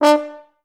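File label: FHS1 staccato D3, Advanced Orchestra; Best Service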